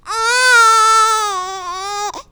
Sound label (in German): Babyschrei Mandarin